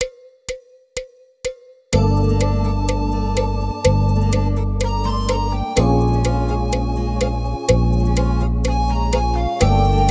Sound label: Ska